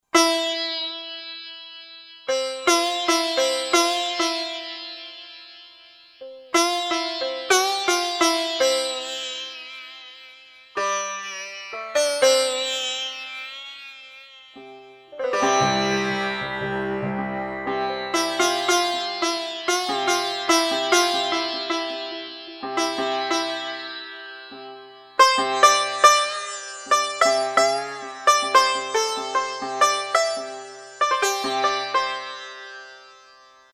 Sitar Guru; World; Roland SR-JV80-14 World Collection Asia

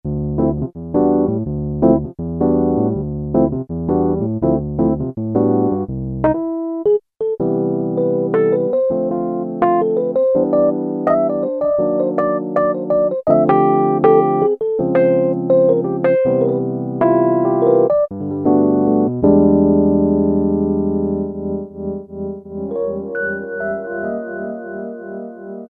PNO-73 Suitcase, Roland Keyboard Instruments; Roland L-CDX-02 Keyboard Instruments

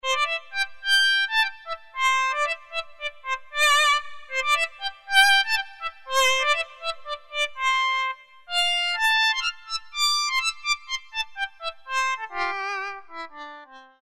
Harmonica, Roland Alpha Juno-2; Factory B

